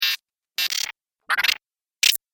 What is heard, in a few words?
Android - Walkie Talkie Beeps; Robot Sound Effects